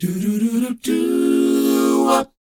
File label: DOWOP D#, Vocal R&B DOO WOP; ILIO; Vocal Planet